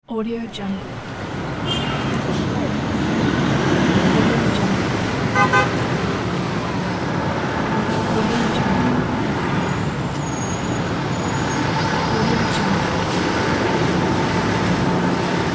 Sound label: traffic ambience; sfx-for-you